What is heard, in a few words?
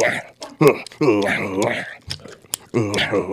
chews, Beatbox, Drum loops